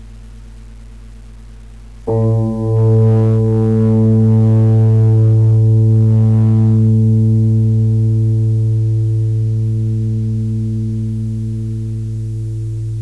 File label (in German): monochord magnetisch nur zwei